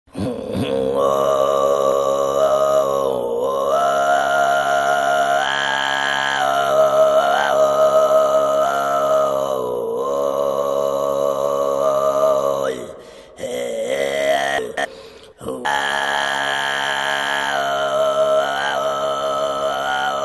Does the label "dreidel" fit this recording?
No